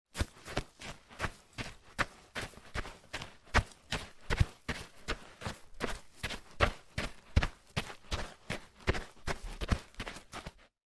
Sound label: forest footsteps, run; forest footsteps sound effect; games footsteps run sounds